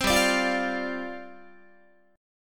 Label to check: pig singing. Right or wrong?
Wrong